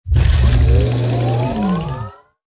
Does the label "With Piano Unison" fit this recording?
No